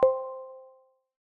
Cheers, iOS Sounds

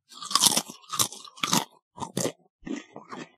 eat chips; Golden Crayon Sounds

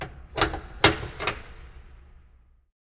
door open; Golden Crayon Sounds

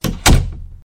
door close; Golden Crayon Sounds

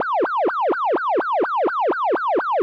police car siren dist; Original TK Sounds

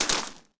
gravel land; Golden Crayon Sounds

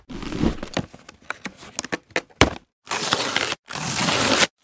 pack open; Original TK Sounds

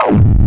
Braille Chord Clock Tick; ESAN VO Sound Schemes; 8 Bit Sound Pack

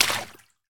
water step; Golden Crayon Sounds

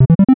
Scroll Page; ESAN VO Sound Schemes; 8 Bit Sound Pack V2

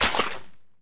mud step; Golden Crayon Sounds

